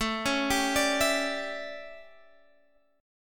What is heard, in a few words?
A 11th down Guitar Open F, chordshape; arpeggiated